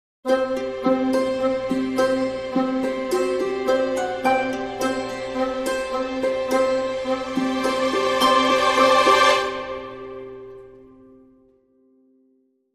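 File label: Dramedy Sting, No Percussion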